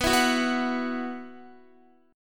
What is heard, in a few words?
B Suspended 4th, Guitar; Bsus4; strummed; x,14,14,11,11,13